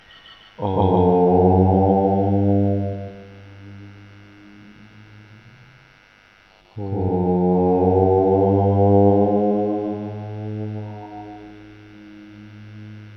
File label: Soul Chanting